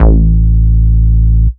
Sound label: Bass Root A